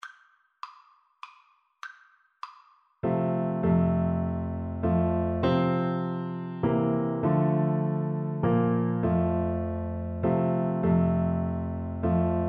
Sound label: amazing grace Euphonium